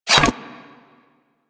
Inf Boomerang Catch C; Warframe; Weapons; Infested

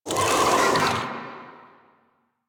Infested Big Ashen Vocal Attack; Warframe

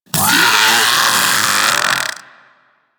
Infested Splitter Top Vocal Death; Warframe; Grey Strain

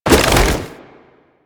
Infested Jetpack Jump Woosh; Warframe

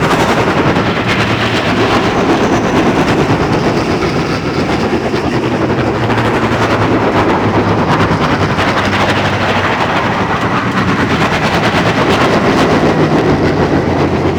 dropship loop; Grineer Prime; Warframe; Tau

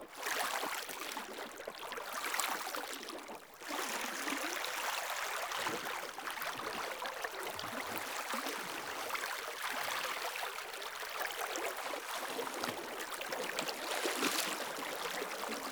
Water Deep Wading Movement Loop; Player; Entity Contact Movement

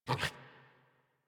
Puppy Idle Snort; Enemies; Vocals; Kubrow; Warframe

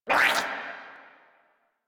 Attack Effort; en; Warframe; Grineer; Jester

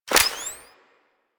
Mode Switch To Single, Pistol; Weapons; Grineer; Amphis

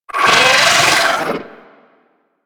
Amalgam Moa Satyr Vocal Death; Warframe

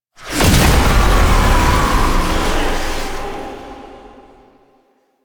Death Stinger; Enemies; Warframe; Entrati Doppelganger

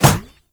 Baby Step; Warframe; Nokko Colony; Visions

